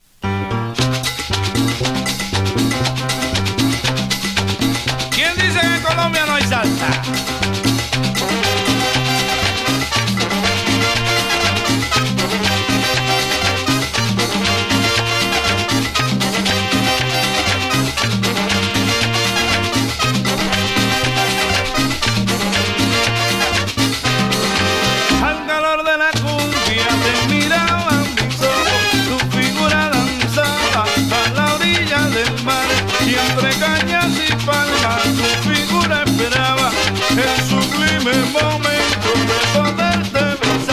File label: Latin music